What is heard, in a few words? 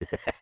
laugh short, fr; Sniper